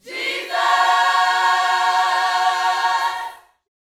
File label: Vocal Gospel PHRASE